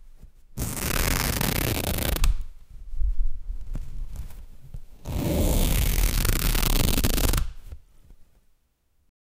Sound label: tearing fabric heavy rip; material